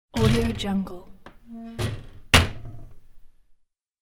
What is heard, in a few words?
furniture cabinet door moving